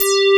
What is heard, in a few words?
UNDER X BASS, Synthsonic; Pro-Rec - Synthsonic; BASSES